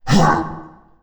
fist pound; finalmother

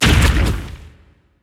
beast lava ball splash

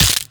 bone break

